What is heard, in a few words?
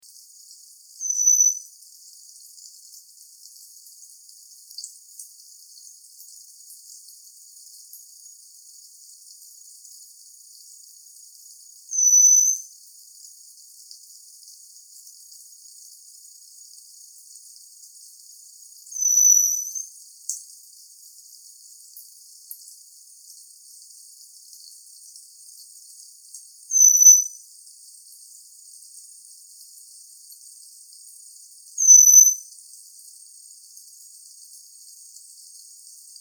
Blue Whistling Thrush Myophonus caeruleus; Ladakhi name:Chu-srak